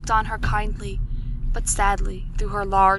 noisy

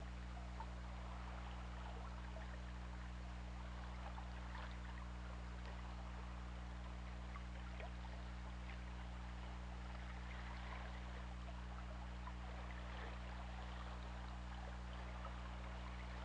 Orca; OrcaLab

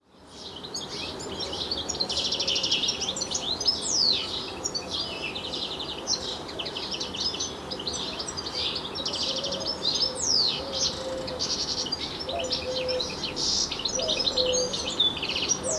Carduelis carduelis; SETE

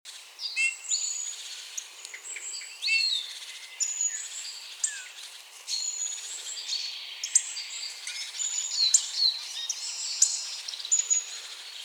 Pyrrhula pyrrhula; SETE

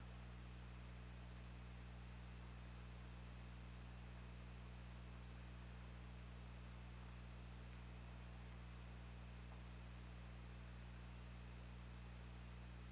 Orca; OrcaLab

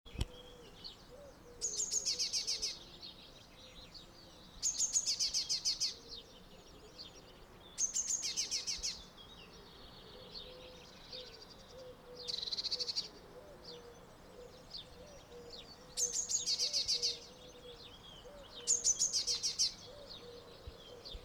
Cyanistes caeruleus; SETE

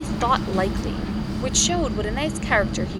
noisy

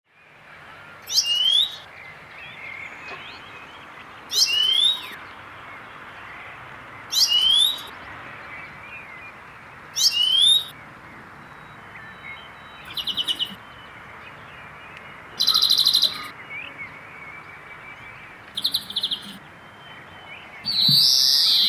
Chloris chloris; SETE

